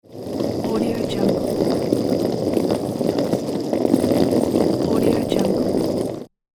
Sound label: rolling suitcase